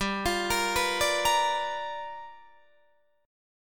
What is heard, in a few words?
G 7th Sharp 9th G chord, Guitar Open D